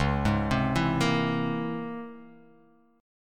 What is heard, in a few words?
C sharp Minor Major 13th C sharp, chord; C#mM13 arpeggiated; JGuitar